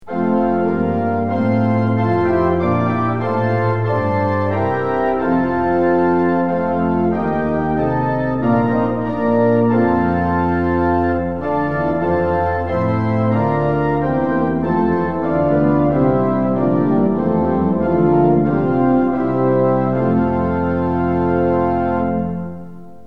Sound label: tune; hymns; lillenas